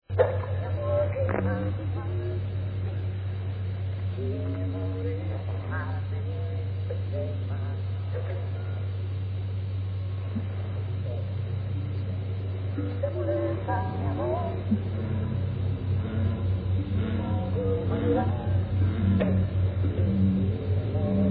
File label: Song Frog